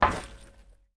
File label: metal footstep